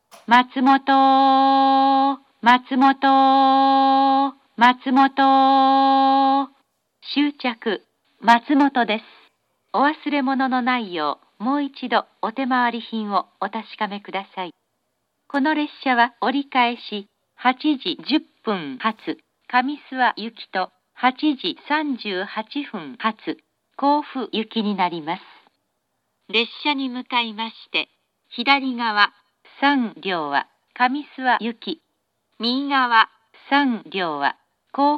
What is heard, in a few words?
matsumoto turn local kami-suwa local kofu, station; jr-east